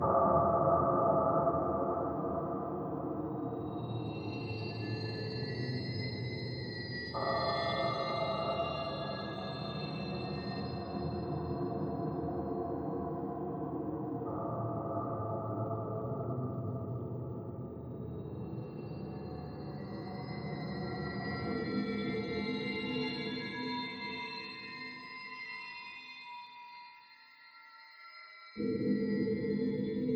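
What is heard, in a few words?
Music Demon Arena Ambient Explore loop; Hunted: The Demon's Forge; x360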